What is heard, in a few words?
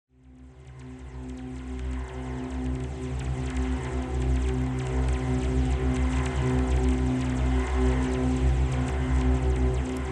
Rainwire Sonification